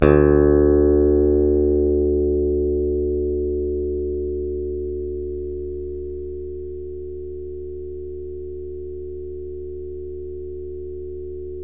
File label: PICK BASS, Electric Basses; PlatinumPhattCD2; E-MU Producer Series Vol. 8 – Platinum Phatt